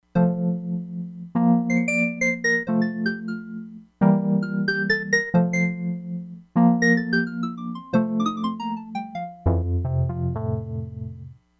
WURLITZER ELECTRIC PIANO - SYNTH BELLS, Crumar Bit 99; Factory Programs